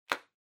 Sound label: Bingo Card Punch